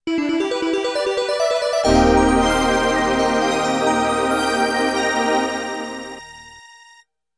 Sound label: sword; Dark Savior